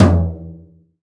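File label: brush hard; Northstar - New Gold VOL-2; 30S BRUSH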